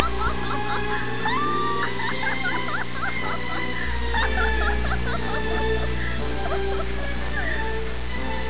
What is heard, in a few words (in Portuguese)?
som mizuha dando sua risada maluca